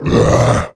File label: attack, male zombie; nmrih; zerosiki; slender